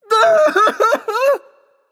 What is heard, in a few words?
Fear; smite; apollo